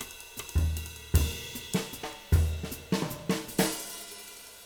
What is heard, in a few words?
310 BEBOP, Living Drums